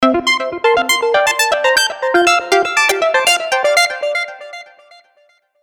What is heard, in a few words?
JINGLE synth